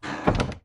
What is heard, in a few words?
Close Door Wooden; Lethal Company